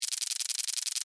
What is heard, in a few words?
Device Readout, Scifi; Tech